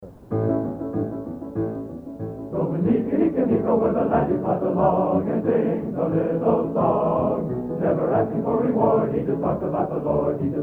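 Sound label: Recording of by the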